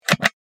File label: Small wooden box container push to open